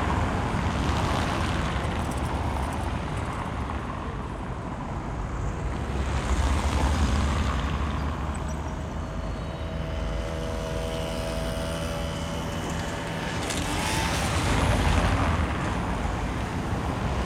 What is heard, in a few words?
ambience canals traffic; Counter Strike Model Pack; CS:GO